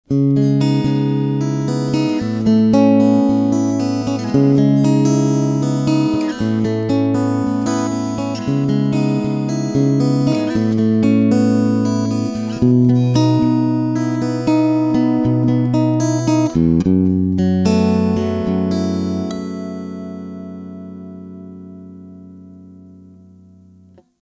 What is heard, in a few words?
bass lab guitar